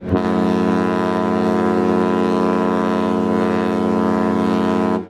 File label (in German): schiffshorn, kreuzfahrtschiff; celebrity eclipse; klingelton